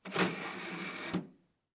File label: DVD Player Tray Open, Technology, DVD Player, Open Disc Tray; Entertainment System; Entertainment Systems